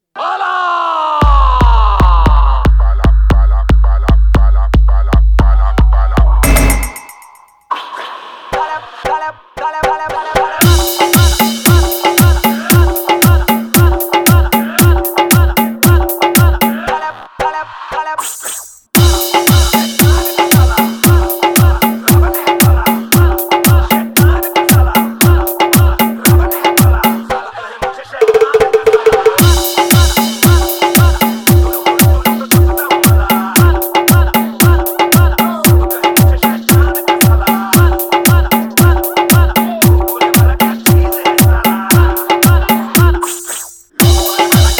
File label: Song